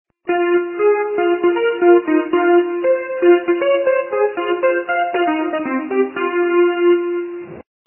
ringtones; beverly hills cop; gmod; perp2.5

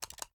sm uzulu reload shake; gmod; iw8

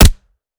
weap famas sup, weapons; gmod; viper

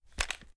reload end; reloads; gmod

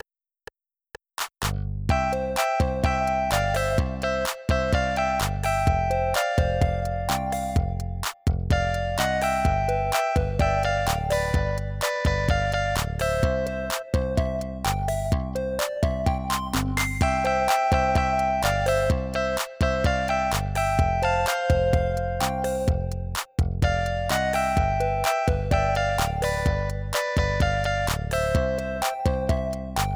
Sam's band (pop version); stardew valley ost

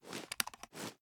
reload empty xxmag tilt; gmod; iw8; uzulu

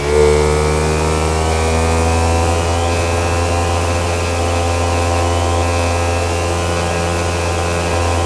fourth cruise, vehicles; gmod; tdmcars; gtav; nemesis

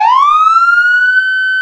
manual, smartsiren; gmod; vcmod; els

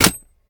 reload empty fast drum magin; reloads; gmod; iw8

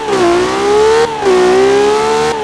second, vehicles

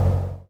stop, vehicles; 17camaro

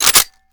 reload empty fast chamber, reloads; gmod

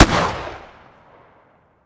shotgun sup fire atmo ext; gmod; viper

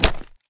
ar reload empty fast end, weapons; viper

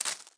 leaf; gmod

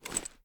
inspect; gmod

